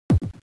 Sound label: bomb bump; WarioWare Inc